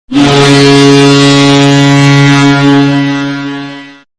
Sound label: goal horn